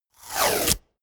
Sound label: tear